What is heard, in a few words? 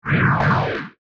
Whoosh Movement, Whoosh, Movement, Flange, Production Element, Imaging Element, Accent, Transition; BlastWave